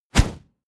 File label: push